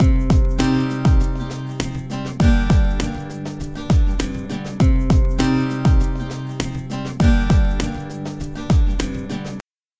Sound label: Mix II Drums AC Guitar Dry; PerfectSpace